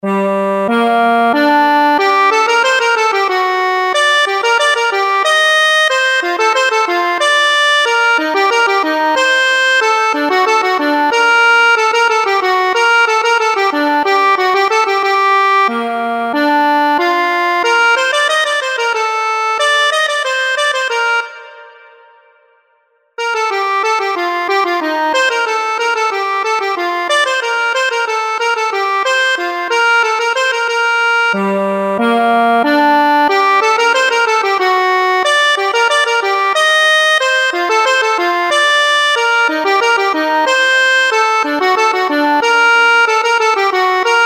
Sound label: rondeau, accordion; purcell